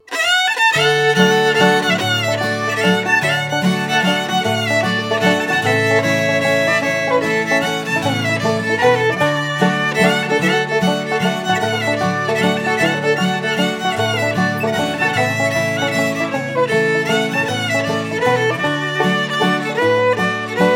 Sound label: Crowley